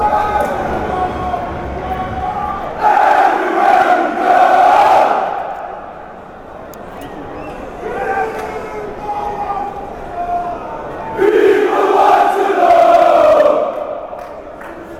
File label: everywhere we go, chants, denmark